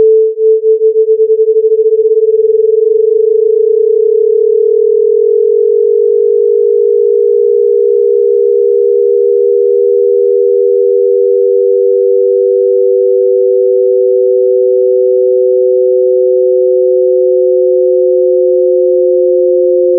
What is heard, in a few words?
binaural tones 440hz